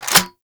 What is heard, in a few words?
Reload Grineer Cannon Insert C